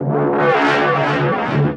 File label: Trance Sweeps; Vengeance Samples; VEC2 FX